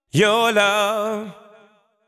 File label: deep house male, 125, A, vocals